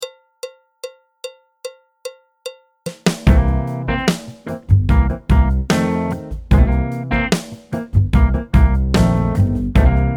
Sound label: alto, trumpet, trombone; Jazz Syllabus Level 3